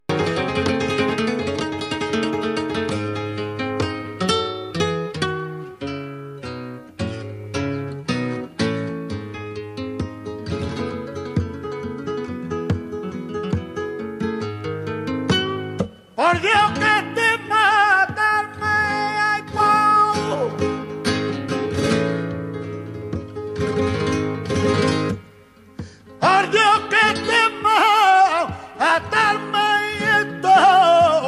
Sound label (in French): soleá de Paquirri, chant, guitare; "Por Dios, que eso es matarme..."